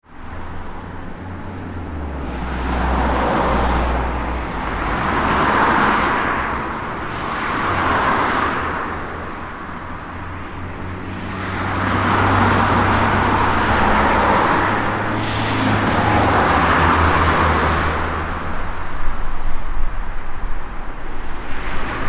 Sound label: ambience urban traffic main road med wet, Traffic Urban Wet Main Road (40Mph); Traffic Ambience On Main Road - Medium , Approx 40 Mph On Wet Road Surface; Traffic and Cars; Backgrounds; McKinney Sound